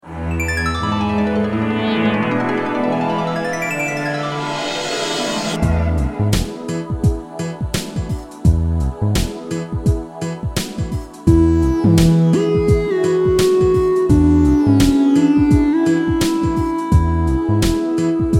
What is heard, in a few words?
Electronic